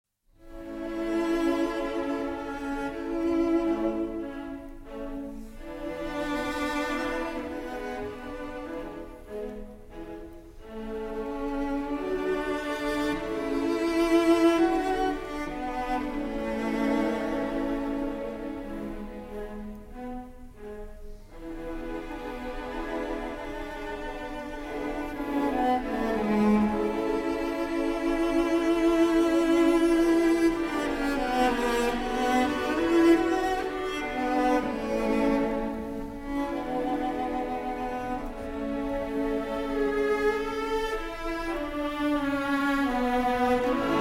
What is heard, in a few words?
Cello Concerto in D Major, Adagio; Haydn; Hob. VIIb2